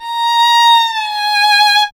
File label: STR A#5, Solo Instruments Vln; Miroslav Vitous